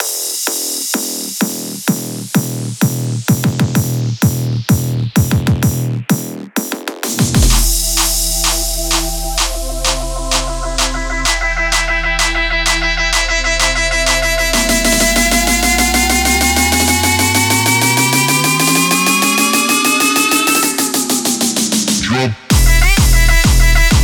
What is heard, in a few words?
128Bpm E Full Mix; Kit 02